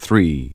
VOX GENERAL; Megabass Remix! 2

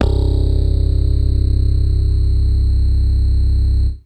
c1, FAIRLIGHT Electric Bass; Retro Sampler

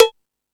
Ambient Rock, drum machine; E-MU Pro-Cussion-1

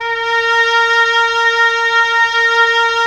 Oboe; Roland - LA Composer vol. 4

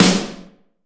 snare, Percussion; realdrum

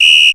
WHISTLE, Yamaha RX 21 drums

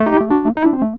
E.PIANO, KEYS

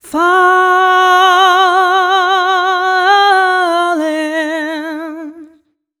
f mel e flat, fem Vocals

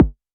Multi-Kick Short

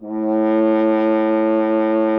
F. Horn; Miroslav Vitous; Solo Instruments VOL-2

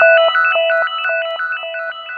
lead (sparklecho); xtra lead; Sonic Foundry (Sony Creative Software); Ugly Remnants VOL-2

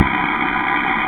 vox noise C3; Big Fish Audio; Synth City

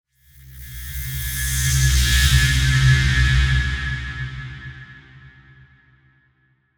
Tone Riser (C2)

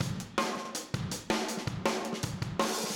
Room, Drums, 162 BPM

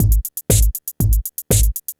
PHATT BEA

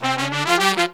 Pop & Funk Brass, 096

